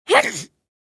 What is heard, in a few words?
Cute kid sneeze boy child